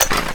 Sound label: Mag Place; UltimateXR; FullScene